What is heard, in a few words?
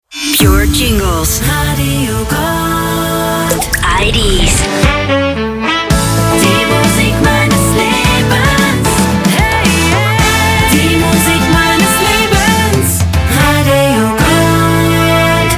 jingles radio gold jingles; pure